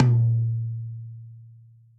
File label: RACKTOM stick center, Tom; Ableton; Factory Packs; Drum Booth